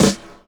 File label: SD, HIP, Dance Mega Drums